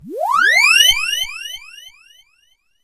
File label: beam